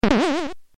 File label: bounce